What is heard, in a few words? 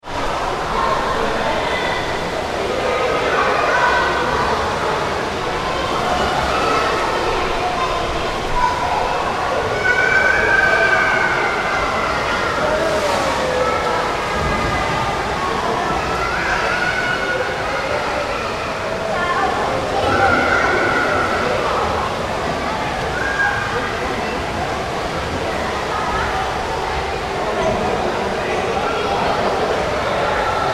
Swimming Pool Atmosphere, Large indoor pool activity - kids screaming; Pools